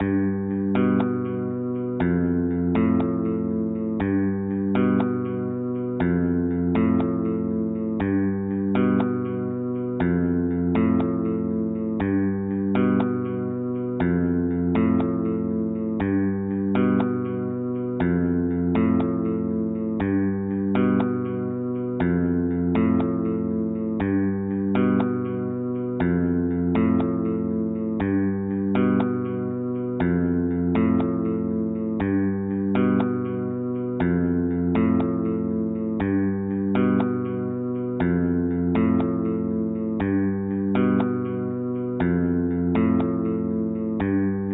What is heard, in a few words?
bgm